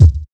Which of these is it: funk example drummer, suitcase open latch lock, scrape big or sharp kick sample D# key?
sharp kick sample D# key